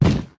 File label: Boot Stomp; Lethal Company